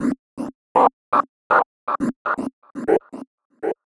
Rhythmic (120BPM); musicradar

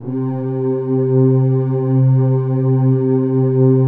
PAD, DIGI-PAD; Best Service; XXL 800 Pads; Sample CDs

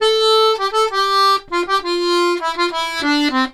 Accordions; USB Soundscan vol.40; AKAI

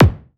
BD, BASS DRUMS; EdgeSounds

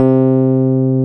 PIA, E-PIANOS MKS-20; Sound & Vision; Gigapack I CD 2

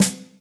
Snare, Yamaha RY20

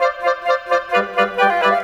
Cinematic Winds

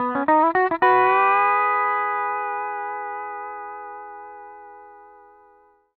105 L, PD LOOPS

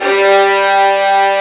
VIOLIN G2

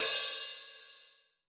crash, Zoom MRT-3B

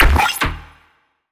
bass shot

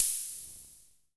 CYM3, Electro-Harmonix DRM-16

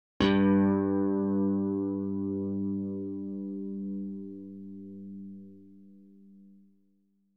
bass slap g2; Sound Sampling Collection VOL-5; Drums & Bass Fusion Funk Effect

